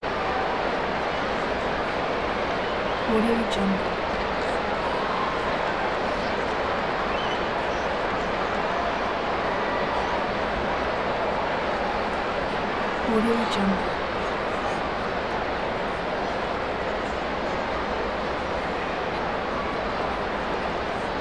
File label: baseball crowd idle whistles chatter